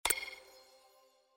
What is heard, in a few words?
open box